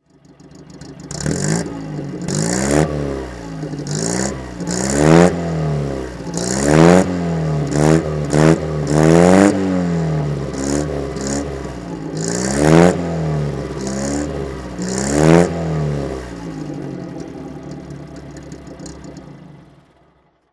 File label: MG PB throttle, open four seater; Engine sound; MG PB 'open four seater', 1935; V3D/ ClassicarImages; Marc Vorgers